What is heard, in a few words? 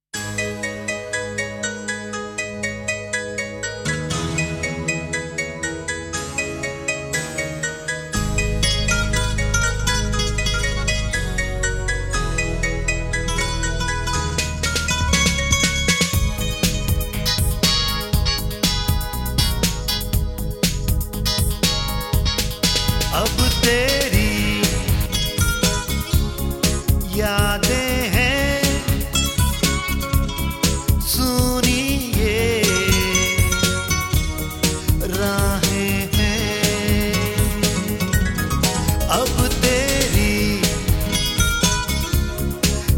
Urdu Arabic